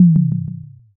tom

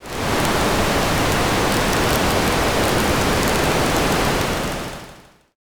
fire

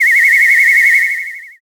whistle long

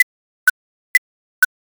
ticktock

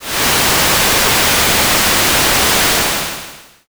rain

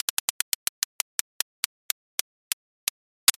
roulette

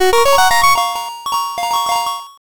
jackpot

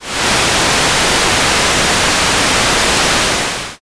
rain

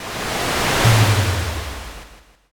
fire magic

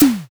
pistol

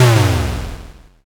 explosion

